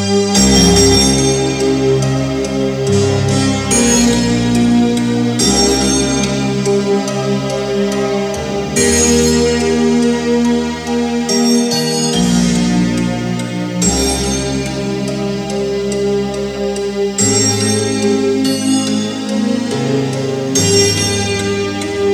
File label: Tyger instrumental